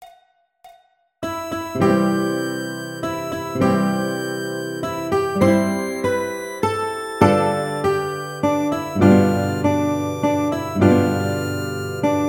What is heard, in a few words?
Brahms Lullaby Diatonic, harmonica